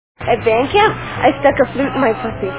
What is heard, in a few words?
flute